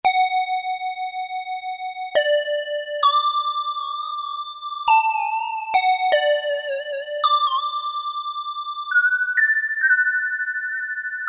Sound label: Multiple Inst, Korg DSS-1